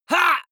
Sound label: male fight hit shout; comedy-cartoon; soundpool